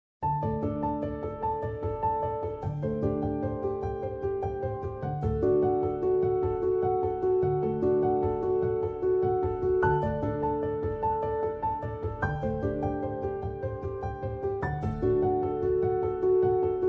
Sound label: Morning Raindrops Reduced (Solo Piano)